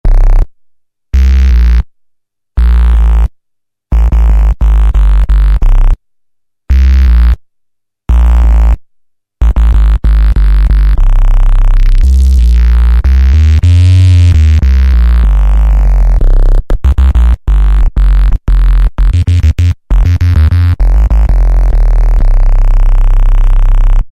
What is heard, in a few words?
Korg microKORG Dirty Bass; Factory Voices